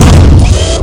Cannon Recoil; Phoenix

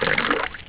POUR

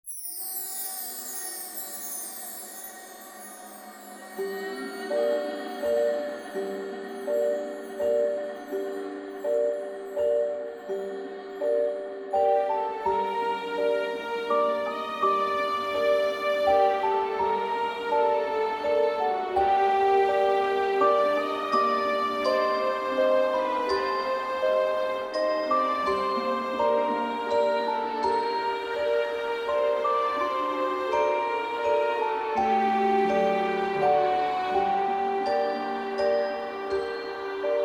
mystery; Keepsake; music

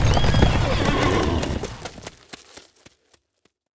horse

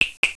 plop; Walt Disney

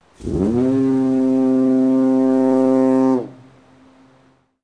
HORN, Seaport; PC Gamer IT CD 40; zones

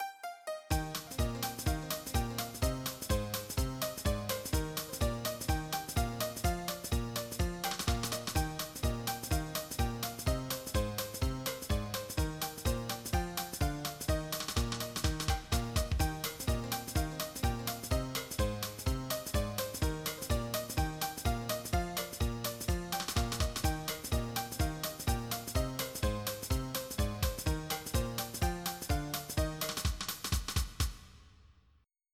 HONKY

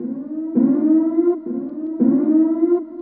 chem alarm; specops

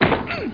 punch

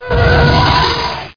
tie by

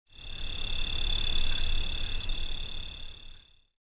CRICK, nature

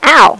ow; Toxic Ravine v1.1; The Arcade

